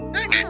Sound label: bark bark; korone; vtuber